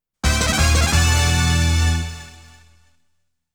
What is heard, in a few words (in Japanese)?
FANFARE I(階級UP BGM); konami amusement sounds 93 summer